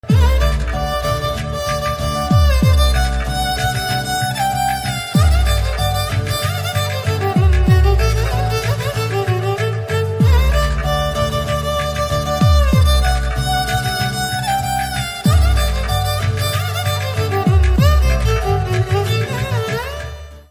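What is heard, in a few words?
Violin background music Ringtone; Naandhi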